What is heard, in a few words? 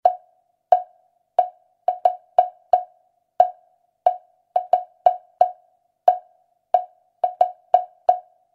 woodblocks; lateinamerika